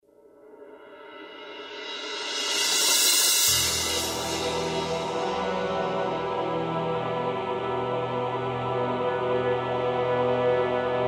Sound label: Mission Failed; soldier of fortune ii double helix 2002